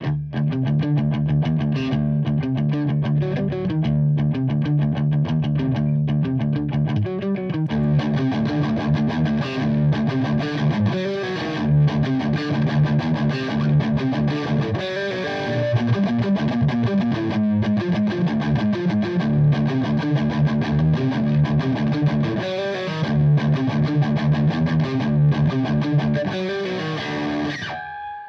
Verzerrer Pedale Gain Channel Sweet Spot; Revv G3

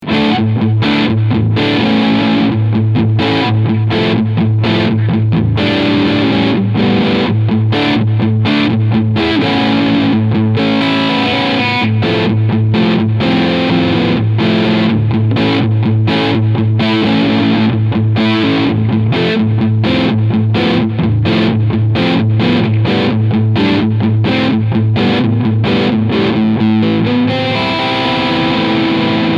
Crunch beide PUs

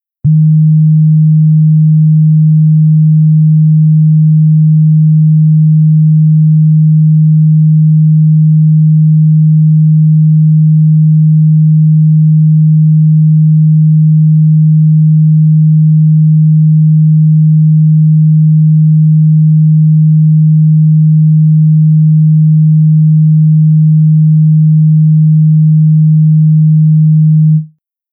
Xils 4 Sinus 130Hz

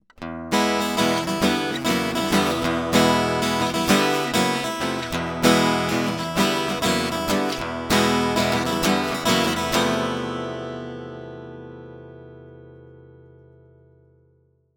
Saffire Pro 26 Western Gitarre

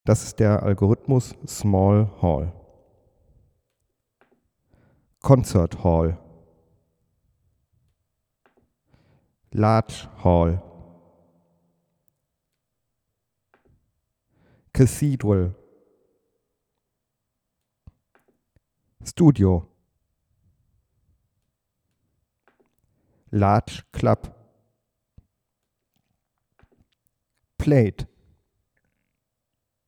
StudioLive AR16c Effekte

